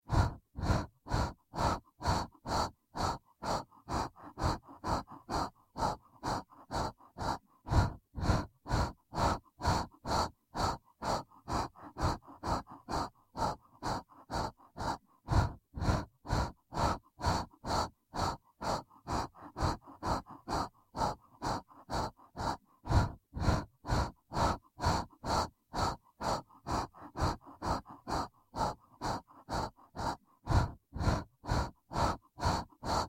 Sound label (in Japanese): ハッハッ 普通